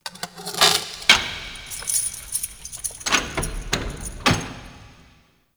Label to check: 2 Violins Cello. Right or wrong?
Wrong